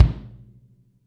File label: 3 qtr damped kick f, Deep House single hits